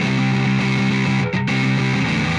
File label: Pushing Riff, Rock Guitars